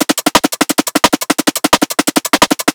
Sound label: 174 Hats Carousel